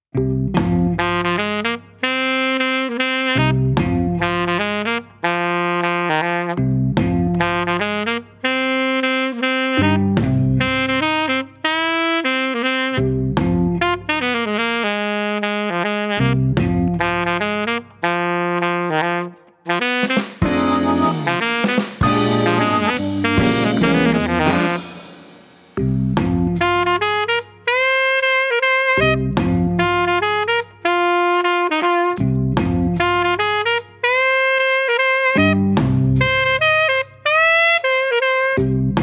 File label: alto work song; ProOne normal